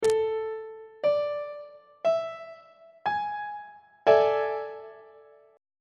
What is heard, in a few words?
a major 7th suspended 4th chord on treble clef